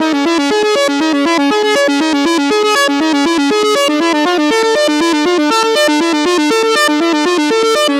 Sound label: Progressive Synth, Trance